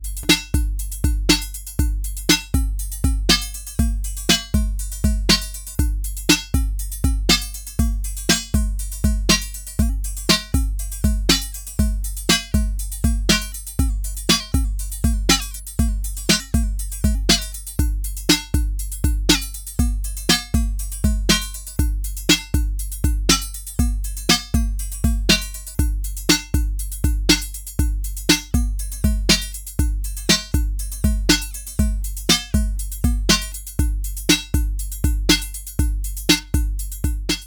Bastl Thyme CV Steuerung Tape Speed

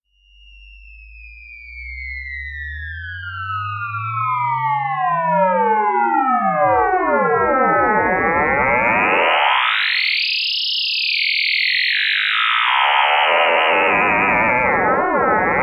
Modulare Spielereien

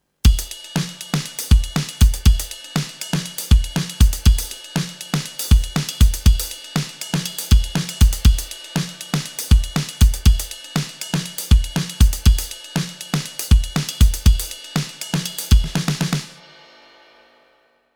Drums Dry mit Console; VSL MIRPro3D

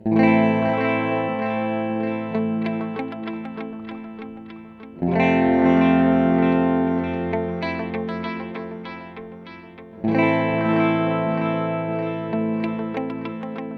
Viertel und punktierte Achtel; Free the Tone Future Factory

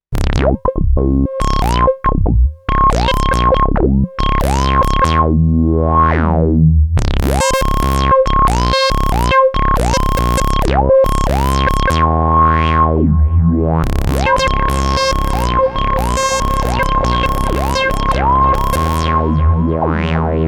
Duophone Sequenz mit Echo

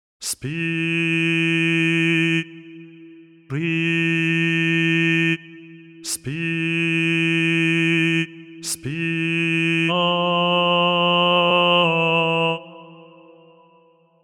Vocal Zischlaute Kehligkeit medieval tenor; ERA II Vocal Codex

